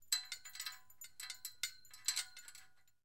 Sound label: Flag Pole Clanks; Rope And Chain Movement Against Flag Pole. - Flag Pole Clanks; flags